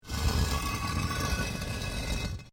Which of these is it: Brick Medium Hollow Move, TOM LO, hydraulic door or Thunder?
Brick Medium Hollow Move